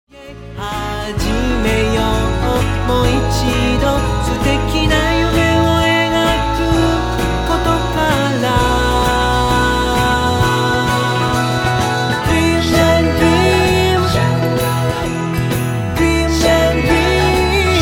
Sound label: solo